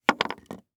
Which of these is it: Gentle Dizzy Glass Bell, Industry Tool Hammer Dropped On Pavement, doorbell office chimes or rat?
Industry Tool Hammer Dropped On Pavement